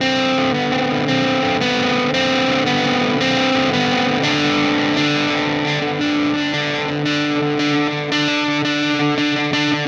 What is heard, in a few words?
Static FX Guitar